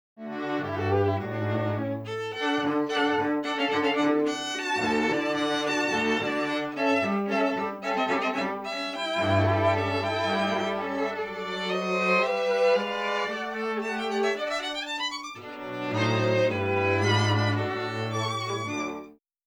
String Quartet

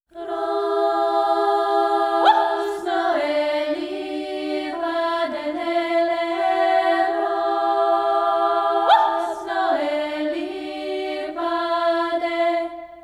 Vocals

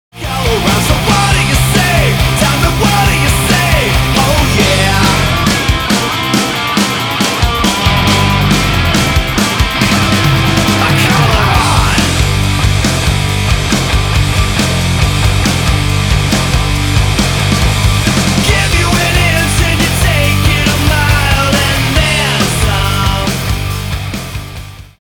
Drums In The Mix

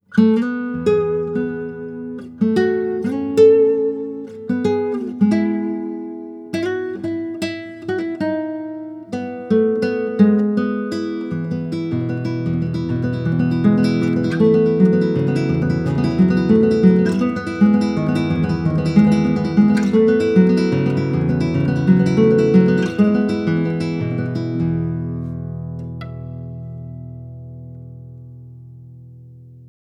nylon

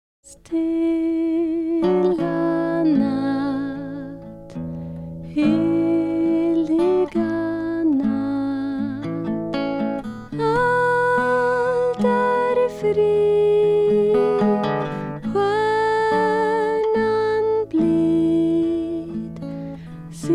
Vocals

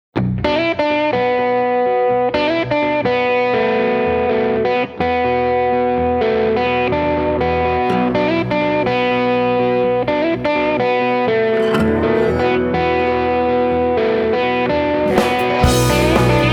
Electric Guitar

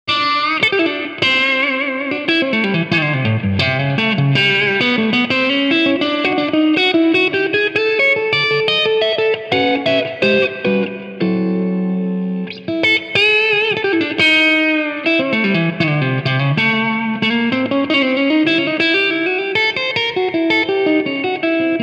Electric Guitar Isolated

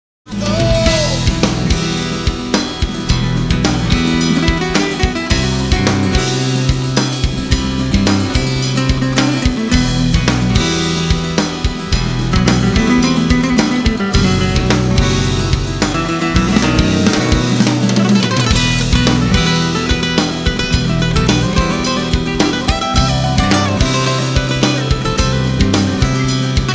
Acoustic Guitar

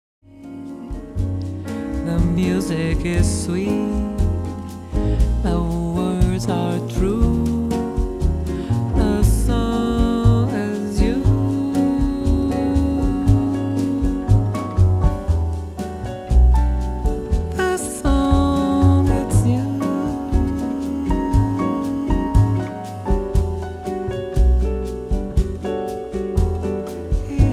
Drums Percussion

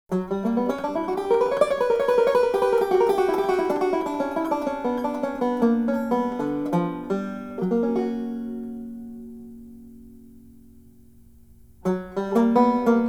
Acoustic Instruments

